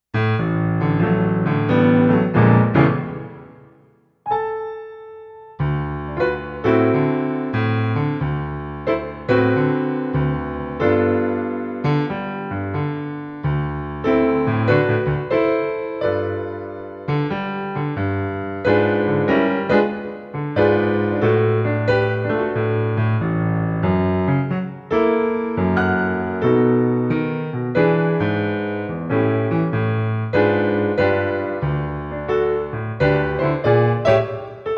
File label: Violin